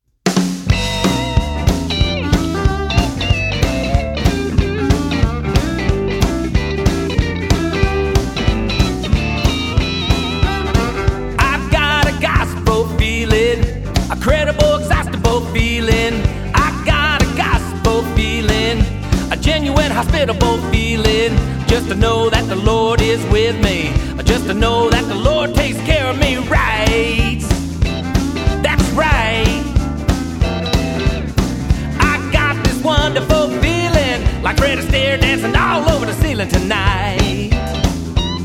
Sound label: Gospel Feeling country